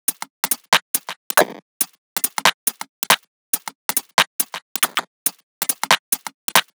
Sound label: Bpm139 Top Loop; Fluctuator; Dubstep